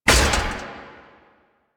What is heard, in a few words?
Turret UI Menu Close; Warframe; Zariman